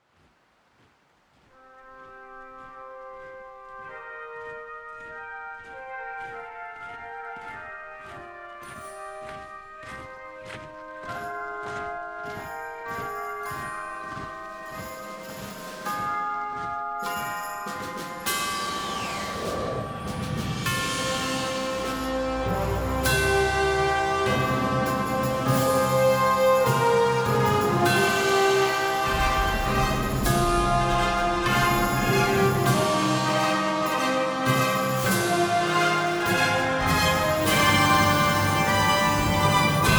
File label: prepare for combat